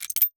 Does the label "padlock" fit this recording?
Yes